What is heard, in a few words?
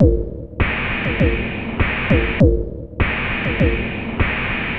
Distant Enzyme Beat, Electronic Beats; Electronica